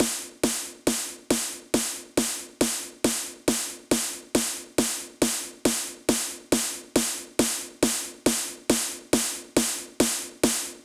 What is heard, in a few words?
138 BPM Snarefill Long; Drums & Perc; Bminor